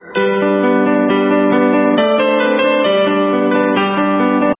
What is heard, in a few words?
music sample